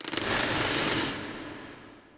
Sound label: glacier end; weapons; dota; drow ranger